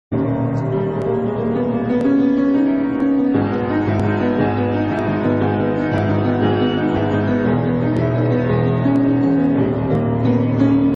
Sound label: creepy song